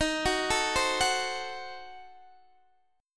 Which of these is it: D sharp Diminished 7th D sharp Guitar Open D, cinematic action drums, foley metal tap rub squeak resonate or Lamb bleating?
D sharp Diminished 7th D sharp Guitar Open D